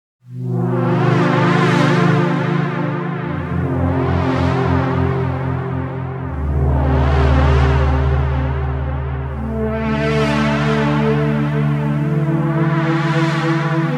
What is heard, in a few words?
pad; bx oberhausen